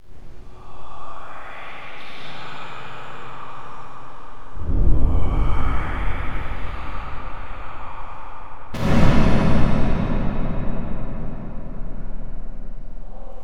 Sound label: whispering and pounding; Creepy Sounds